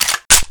ump45 bolt slap; weapons; hk

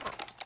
pkm cloth, weapons

